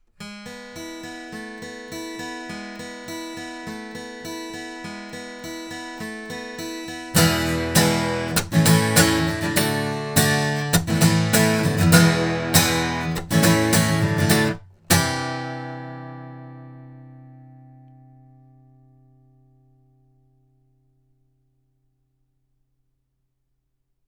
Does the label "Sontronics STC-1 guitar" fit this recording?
Yes